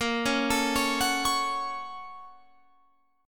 A sharp Minor Major 7th Sharp 5th A sharp Guitar Open F; A#mM7#5 arpeggiated; JGuitar